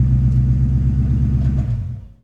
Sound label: Mustang Off; Automobiles